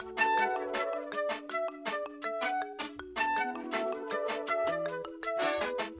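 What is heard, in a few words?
flute horn marimba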